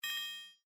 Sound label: Characters Feed Discovered; windows 7